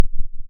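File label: ring modulation tri tri, env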